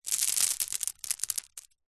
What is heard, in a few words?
small stones handful drop onto others; foley; zapsplat